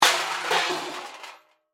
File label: Impact, Crash, Metal, Object; Pots, Pans; Crash Sound Effects; Crashes (Sound Effects:Objects)